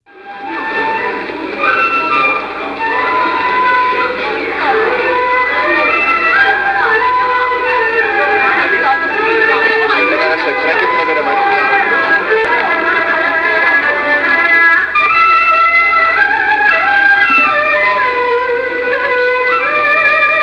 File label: subway duet; china